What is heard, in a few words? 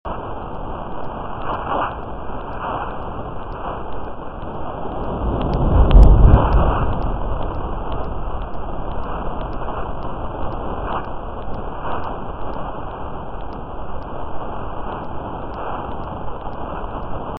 bolid explosion; calif; hawaii